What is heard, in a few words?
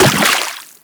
Run Water, Footsteps; Warframe; Teshin